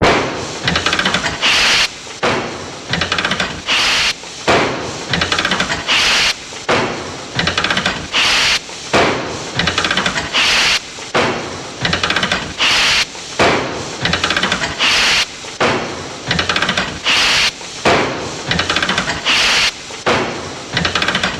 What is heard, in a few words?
Steam hammer, loop with ratchet and steam release